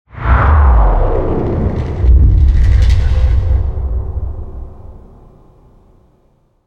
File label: Death Stinger; Warframe; Duviri; Cinematics; Teshin Death